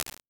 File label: 0.3s Warehouse Room, Impulse Responses; Small Spaces; Indoor Spaces; Apple